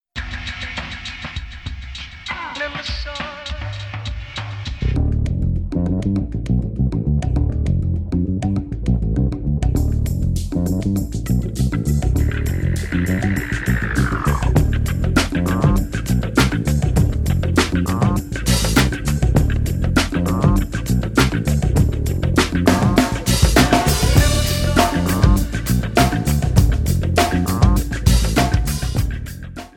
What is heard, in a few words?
No reverb